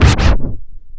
ST SCRTCH, SCRATCH; AKAI S-Series CD-ROM Sound Library VOL-4

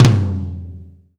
TOM, Laidback; Sonic Foundry (Sony Creative Software); Loops & Samples Drum Tools

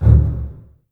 BSS, Basses, String Ensembles; Miroslav Vitous; LINKS ORCH

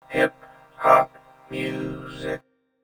HIP HOP; E-MU Producer Series Vol. 8 – Platinum Phatt

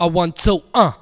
VOX SUNG; Megabass Remix! 2

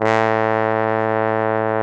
BARITON; Best Service; Alpine Volksmusik

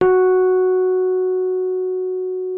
Rhodes MK1 F#4; Xsample VOL-1

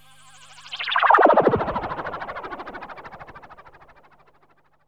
FLYBY, SYN. FLYBYS

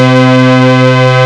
MOOG; Rubber Chicken Software Sample Bank

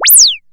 UP ZAP; Back In Time Records; Big Bang; THE TECH

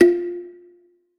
kalimba F; Polyend; ARC Noise

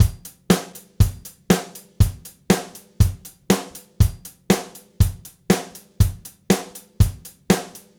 Gretsch groove 120 BPM, 1967 Gretsch Kit drums; Sonic Foundry (Sony Creative Software); Vital Drums

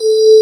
DG WAVE, DIGI SYN

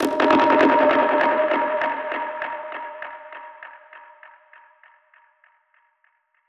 Perc Hit, dub percussion one shots; musicradar

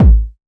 OSH Kick

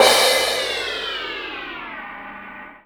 CRASH, Drum & Bass, 150 BPM; Ueberschall; Drum & Bass Resonance

